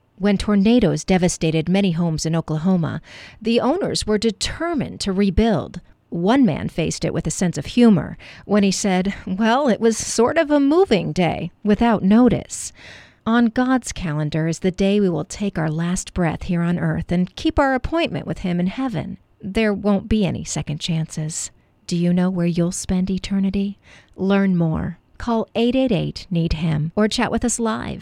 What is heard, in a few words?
Last Breath dry